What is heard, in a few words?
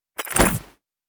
Pick Up Energy; we are the dwarves gamerip 2016